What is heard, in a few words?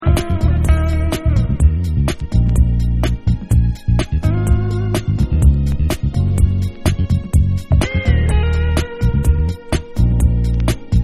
Broken Down Instrumental